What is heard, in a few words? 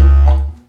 Didgeridoo Kit Samples